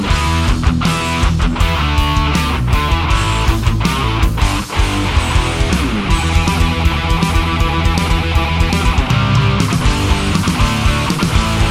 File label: heavy metal